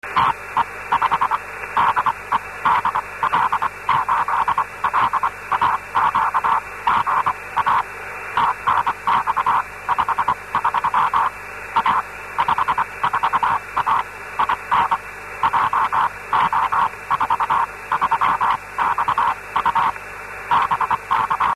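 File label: CW qso, 144 MHz, amateur radio; DL8LAQ JO43XU 515; qso DL8LAQ -OK1TEH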